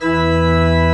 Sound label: E2, CHURCH OR; Best Service; Advanced Media Trax Modern Composer